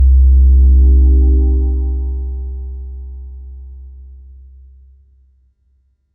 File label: primary nav transition